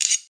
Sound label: blade